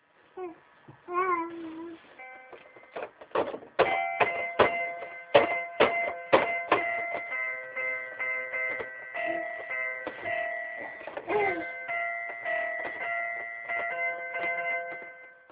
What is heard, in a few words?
plays keyboard